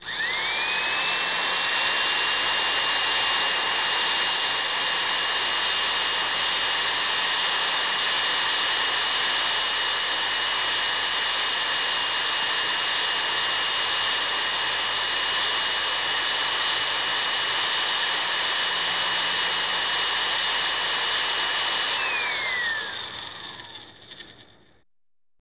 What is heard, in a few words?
electric hand drill